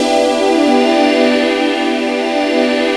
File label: HOUCEY CHORD; AKAI S6000 CD-ROM - Volume 1; SYNTHESIZER